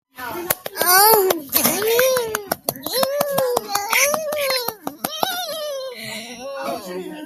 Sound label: Clapping; Sussy